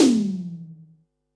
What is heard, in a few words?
snaredrum, Pearl SC-40